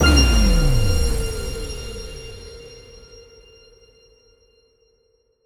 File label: small Win; netent